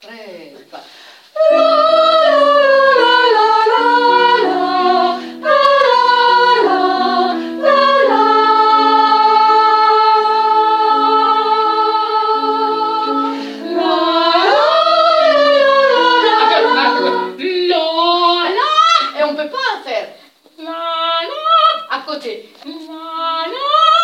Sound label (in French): la la la soprano